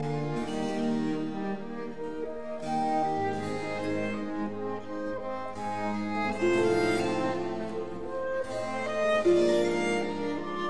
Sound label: Quartet Adagio; Quatrieme livre de 6 quatuors; Quartet No 1 in D major; TWV 43D4